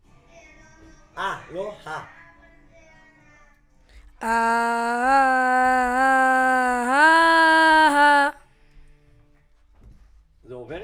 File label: VOC